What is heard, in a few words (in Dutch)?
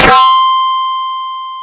Metalen perc, FM; Polyend